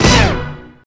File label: HIT, 160 BPM, Drum & Bass; Ueberschall; Drum & Bass Flash Trackz